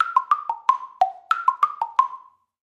Percus Plastic Block, percussion blocks

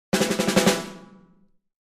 Drums Snare March

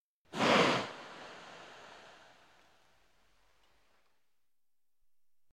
Air Release Heavy

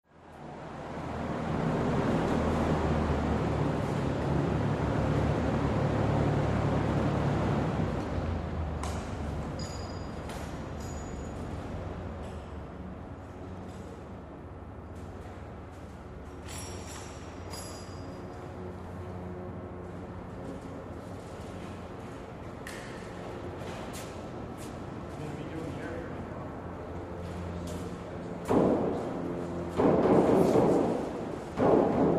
Aircraft Hangar, W Tool Activity; Aircraft Hangar, W Tool Activity, Light Walla, Whistling.; Aircraft Hanger

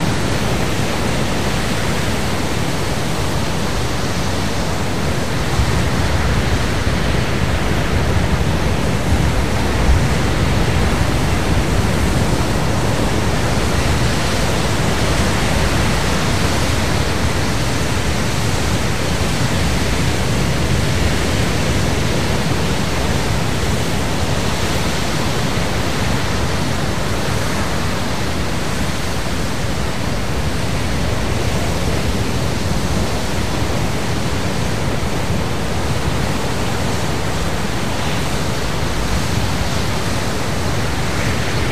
Surf Roar Hush Bed Lig, waves surf

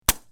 Plastic Pops, bubble-wrap

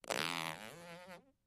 Close Up Fart Sneak

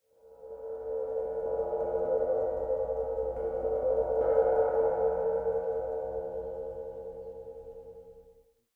Cymbal Med Soft Trill, drums cymbal

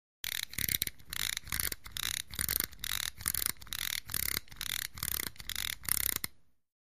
Stopwatch Wind

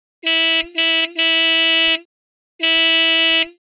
Horn Minivan Horn, foreign

